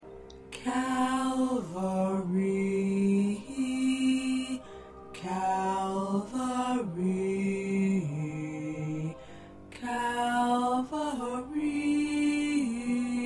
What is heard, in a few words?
Tenor, choir